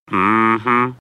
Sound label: mhum